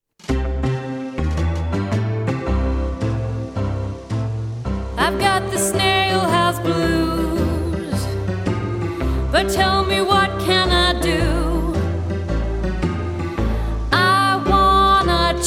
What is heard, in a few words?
Blues